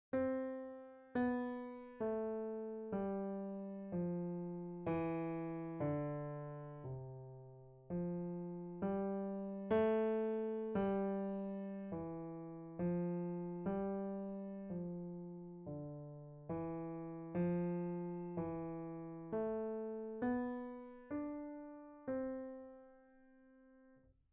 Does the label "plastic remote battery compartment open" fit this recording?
No